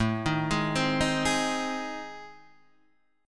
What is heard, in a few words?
A Minor Major 7th Flat 5th, Guitar Open D